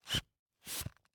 Hand bellows, leather, wood, brass, open, close, wheezy, blown air, supple, creaky; Eiravaein Works; Vaeyan IV